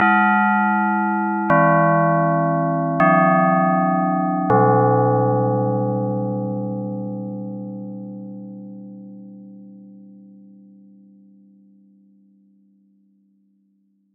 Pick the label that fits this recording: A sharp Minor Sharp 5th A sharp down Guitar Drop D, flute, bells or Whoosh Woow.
bells